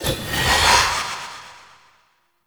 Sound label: Stomp Cast; Powers; Fey; Champion